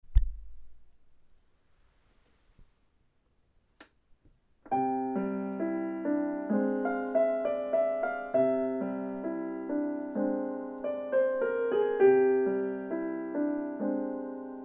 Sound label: Piano Piece